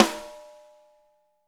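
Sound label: snare drum; Bob Clearmountain Drums I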